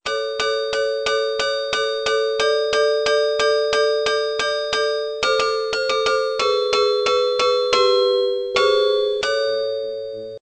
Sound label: bell